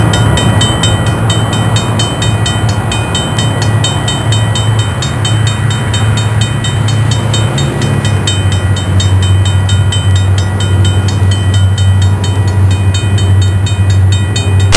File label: Train Passing at Crossing; Soundsation; Vehicles